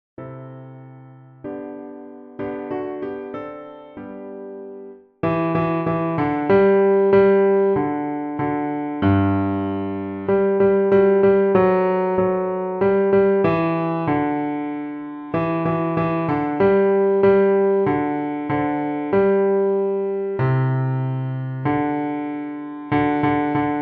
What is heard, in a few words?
piano playing the bass part